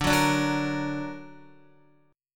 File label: D Augmented D down Guitar; D+ strummed; JGuitar